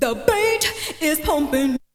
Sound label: VOC